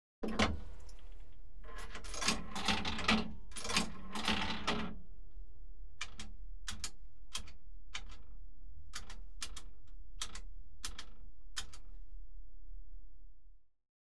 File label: BT Payphones, Pushbutton, Mone; Computers Printers Phones; BBC Sound Effects Library